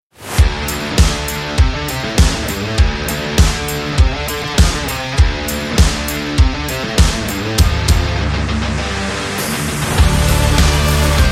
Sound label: Cool Party Rock; active rock music